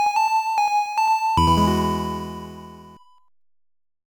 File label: Music - Jingle; fushigi no umi no nadia the secret of blue water; pc engine cd; turbografx 16